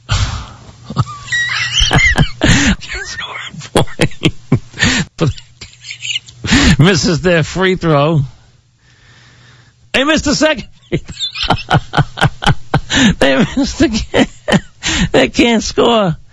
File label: Weasing LAUGHING; WFAN